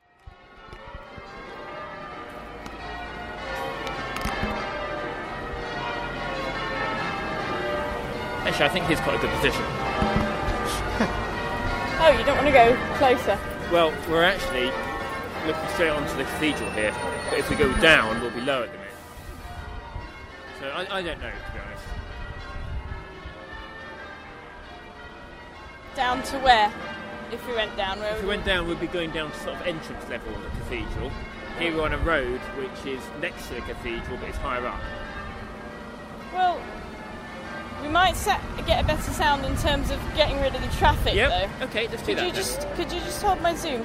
southwark cathedral bells and trains at london bridge